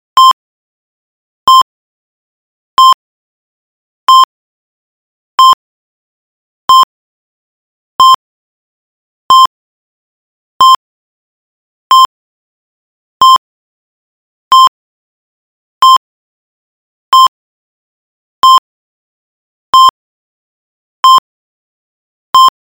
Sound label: Heart Rate Monitors